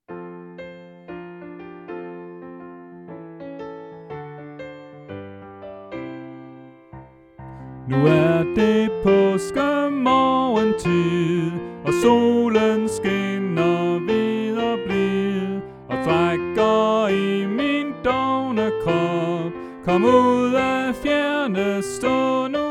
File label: Sopran; oevefiler